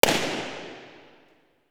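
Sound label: Impulse Response, Temple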